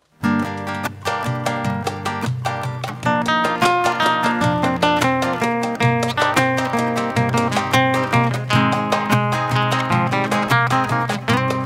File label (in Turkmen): gitara